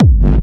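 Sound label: hard bass drums; Bazzdrumz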